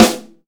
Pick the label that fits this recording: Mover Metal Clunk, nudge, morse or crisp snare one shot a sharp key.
crisp snare one shot a sharp key